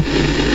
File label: nearby sound; Slendytubbies V2 Beta